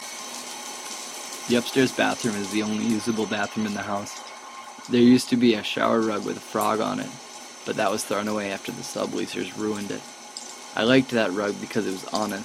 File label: Bathroom; WAS PROJECT